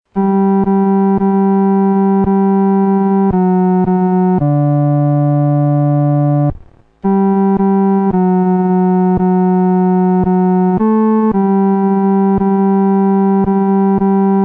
独奏 第三声

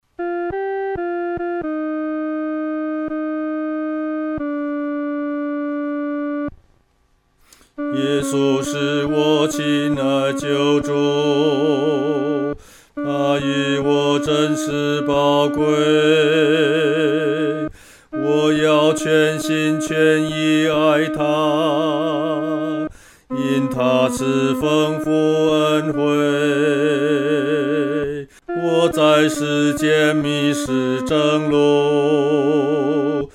独唱 第二声

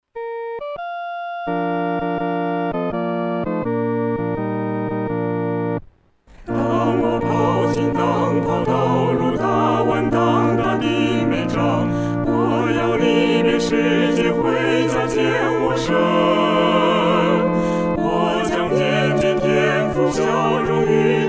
合唱（四声部）

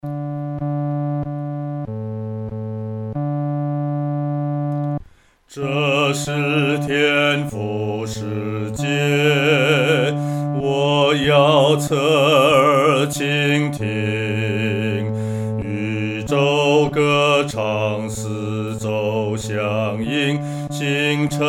独唱 第四声; 这是天父世界

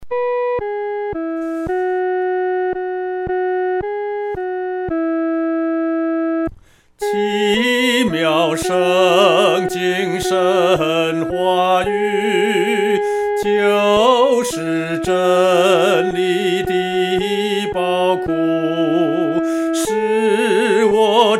独唱 第一声; 奇妙圣经

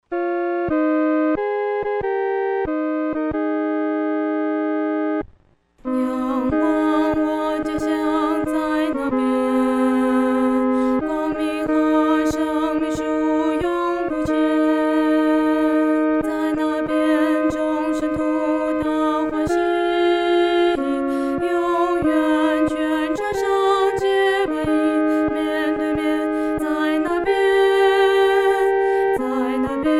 独唱 第二声

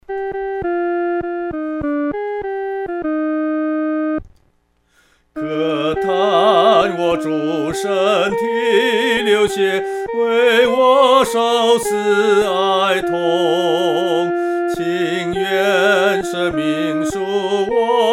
独唱 第一声; 在十字架

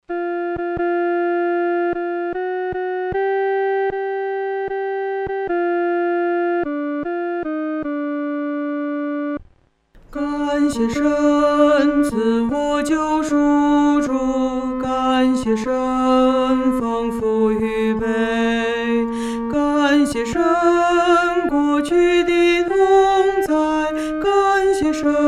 独唱 第二声

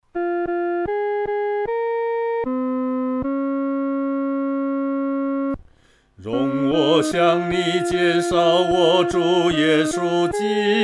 独唱第一声

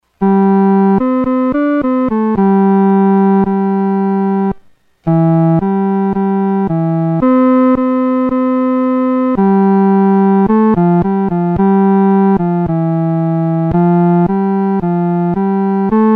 独奏 第三声; 基督今复活

